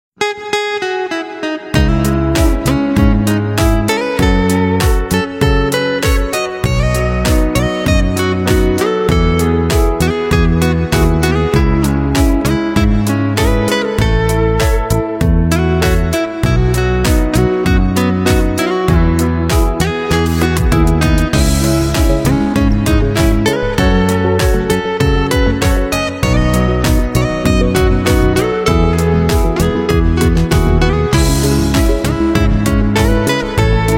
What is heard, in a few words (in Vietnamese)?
nhac chuong guitar solo; tiktok